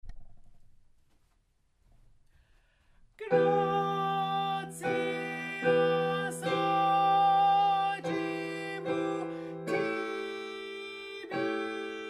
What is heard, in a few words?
Alto; Gratias Agimus Tibi; Gloria in D, A. Vivaldi